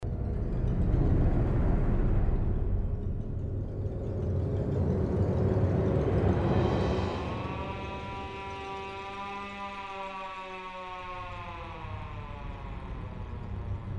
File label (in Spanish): sirena; Evil Dead